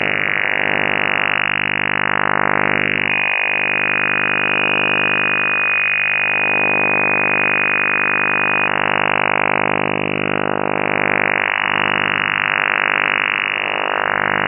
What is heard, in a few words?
othr fmop bw 10k0e 50 sps